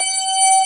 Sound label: B3 G#4, ORGAN; VOCAL ORGAN; ORGAN #1